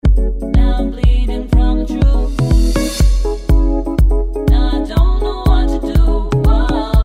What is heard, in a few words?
B Vox